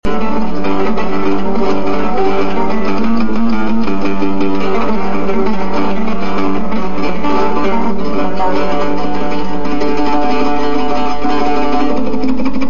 oud

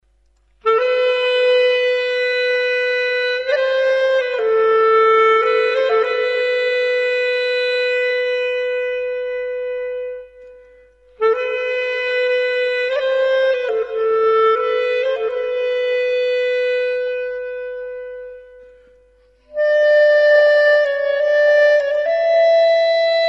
Solo Clarinet, Avaz Dashti

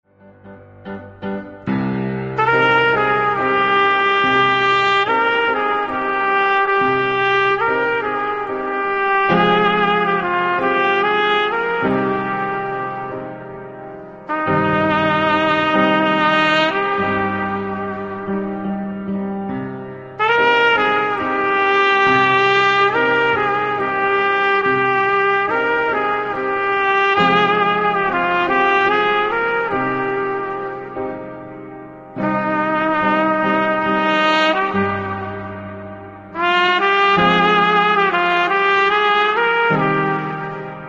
Trumpet, Gilaki

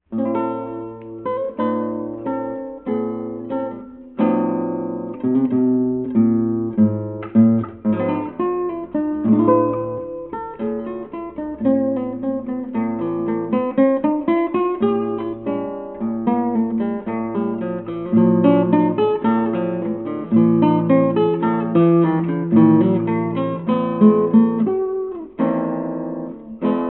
classic sample

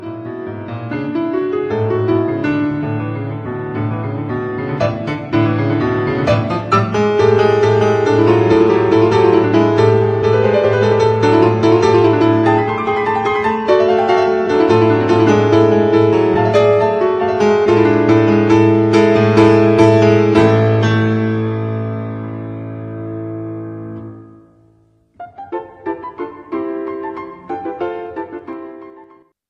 rondo on slovak folk tunes

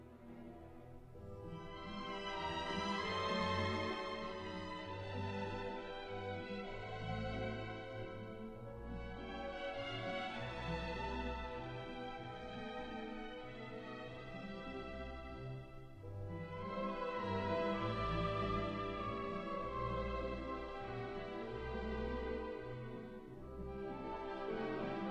slavonic dance

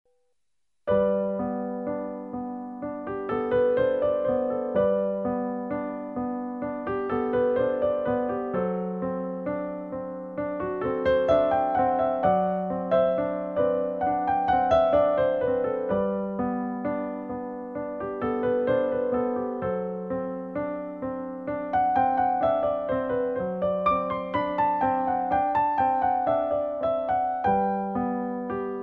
melody g g d d g g d g

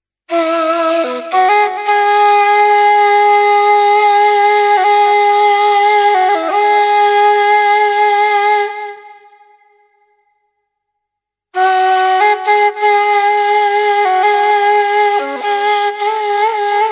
Ney Bam Avaz Dashti

